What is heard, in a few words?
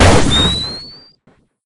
sine gun; hedgewars; Android